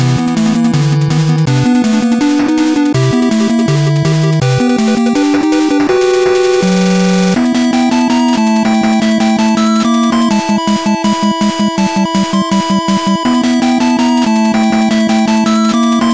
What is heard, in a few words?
battle; rockman7